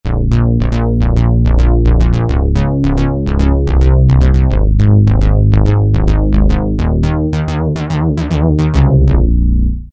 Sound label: Line Bass, Korg MS2000; Factory Patches